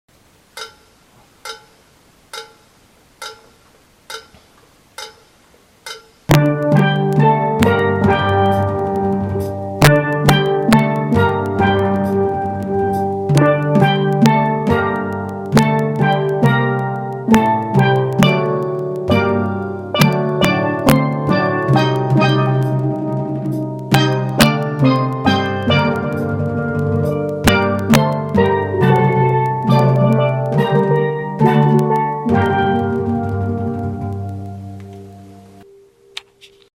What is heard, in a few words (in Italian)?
TUTTI INTRO BELLA CIAO